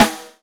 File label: Y9 Snare; Akai MPC500